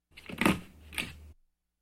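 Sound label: stapling with stapler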